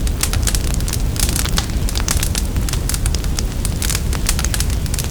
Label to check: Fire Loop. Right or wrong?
Right